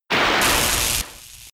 Thunder Shock; pokemon sfx gen 5 attack moves blk wht blk2 wht2

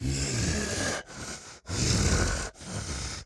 Walk Cycle Burns; The Town

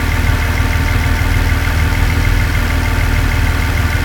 Camry Xse Idle

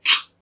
gun

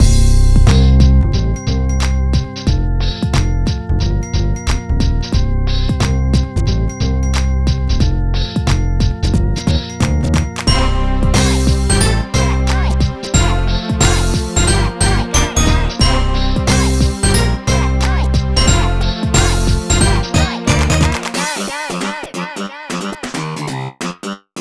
Music DARK BPM104; Super Scribblenauts 2010 NDS; LVL16 DARK1

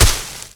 Plant Breaks

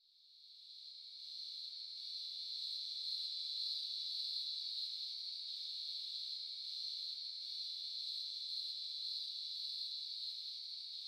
Ambience Nature Night; gamerip; Dream Daddy A Dad Dating Simulator

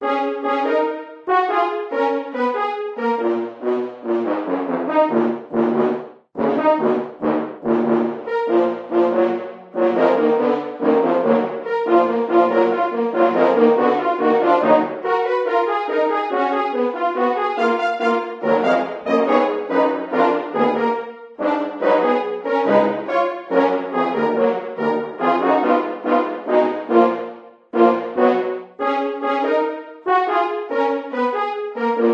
Horn; Badh'r Kilnfest; The Bard's Tale Remastered Trilogy

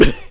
piglette collision; Angry Birds Space

